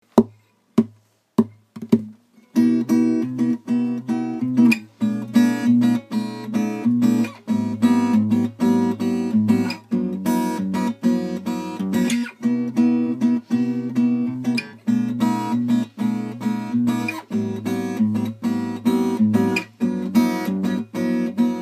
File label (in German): open string voicings beispiel gemischt